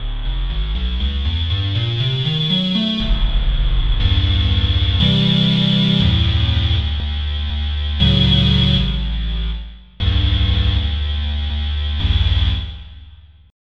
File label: P5 Scratch Sweep